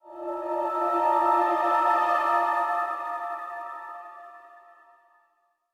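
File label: Music Pump Flourish; bolt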